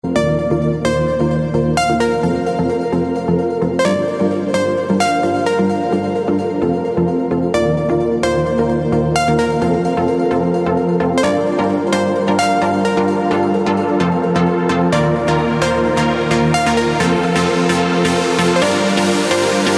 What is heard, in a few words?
piano mix